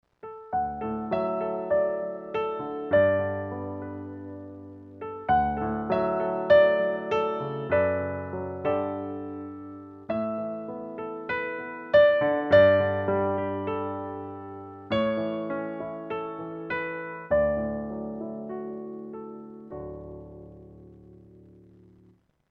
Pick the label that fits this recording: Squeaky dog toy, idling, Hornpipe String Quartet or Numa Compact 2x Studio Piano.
Numa Compact 2x Studio Piano